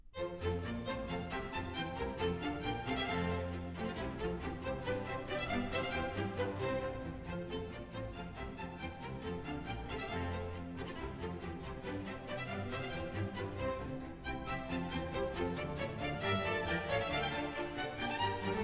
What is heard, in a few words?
Suite No. 1 in F Major Bourrée; Water Music; Handel; Fireworks Music & Water Music